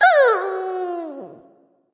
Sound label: boo hoo; bastet